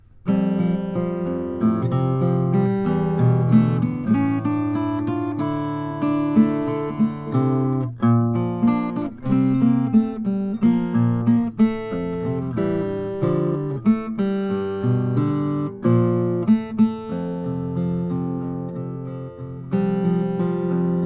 ensor es brazilian rosewood

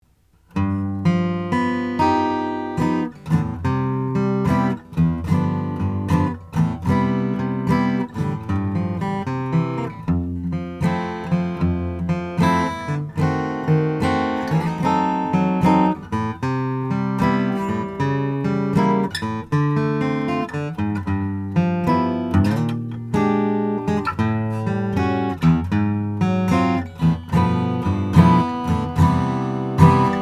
traphagen om honduran rosewood

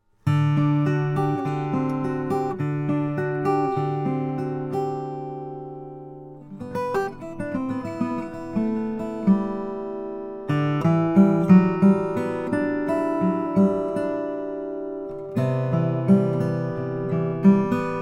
greenfield g3 2 african blackwood

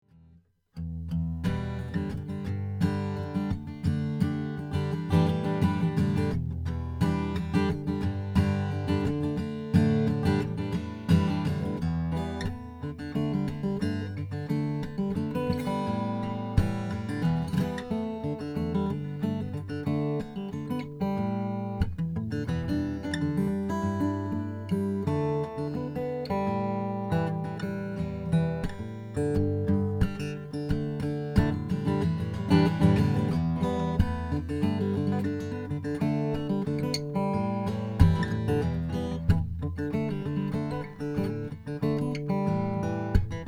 mccollum d highly figured sapel; sapele

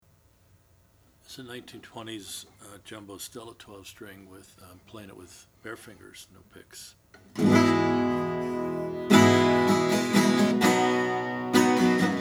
oscar schmidt stella 12 string; natural

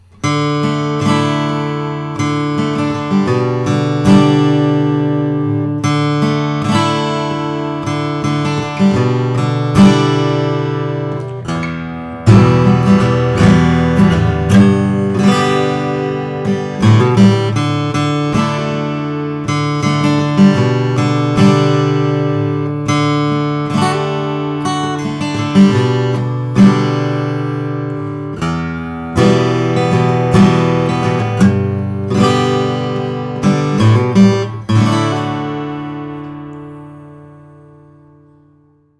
martin 000 42 natural w aging toner

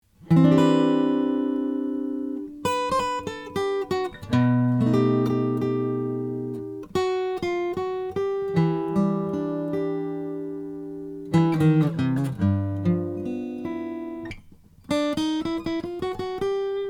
heinonen om adirondack top

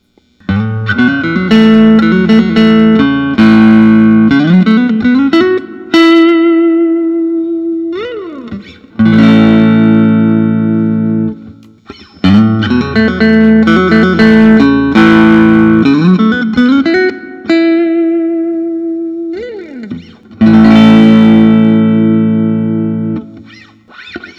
hahn 228 ash maple; 6 2 lbs

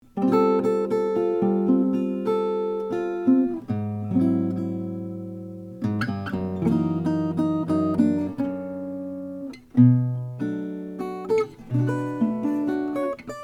martin 2 17 all mahogany

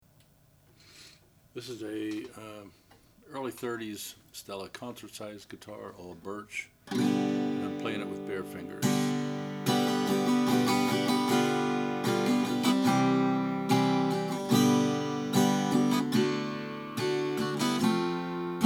1932 oscar schmidt stella dark ebony

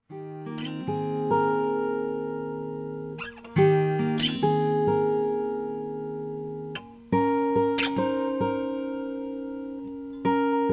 e cutaway royal macassar; ebony; kathy wingert

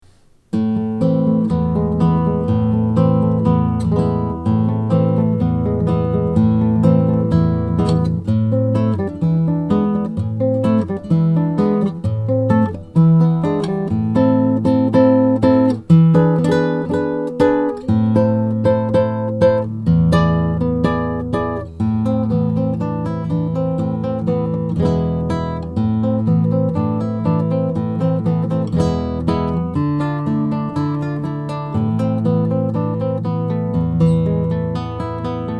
taylor 414ce n ovangkol

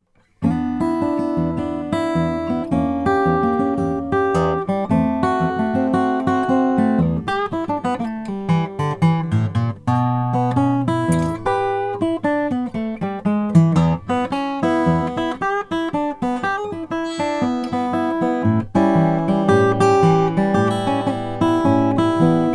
maingard gc old cocobolo

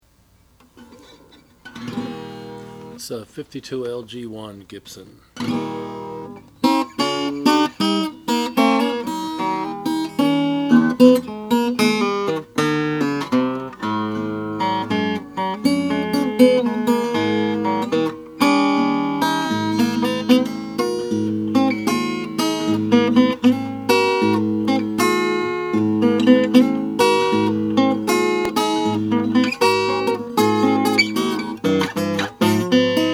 gibson lg1 sunburst

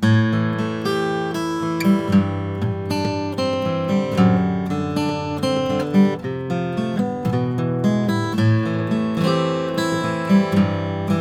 martin 000 42 natural with aging toner

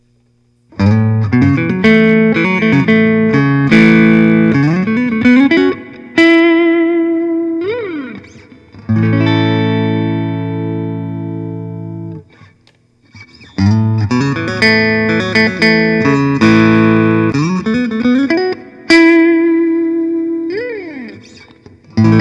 fender telecaster thinline natural

